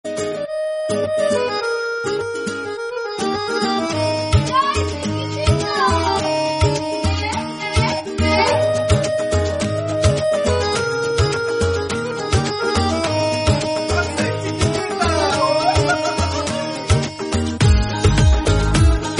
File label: bollywood love instrumental ringtone